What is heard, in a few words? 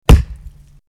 Wood Impact